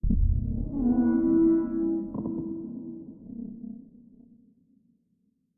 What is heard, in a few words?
tiyanki reanimated move vocal; Stellaris